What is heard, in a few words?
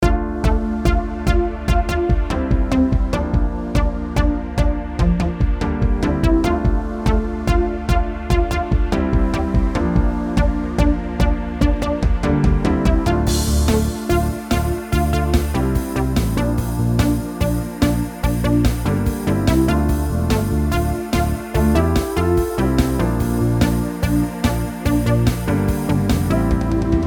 MIDI